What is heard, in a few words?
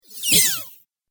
Robot Squeak Swipe, sci-fi robot sound effect; Andrew Fleming; Twistedtracks